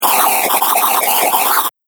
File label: Amazing Air Sound, whoosh; Andrew Fleming